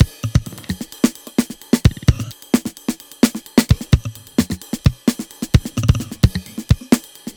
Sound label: Drums 130 Shuffle; Full Drum Loops; Ghosthack; Drum Mega Bundle Demo; Abstract Drums Volume 2